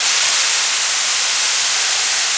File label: Cathode Rain, Environments; Sonic Foundry (Sony Creative Software); Myths of Technology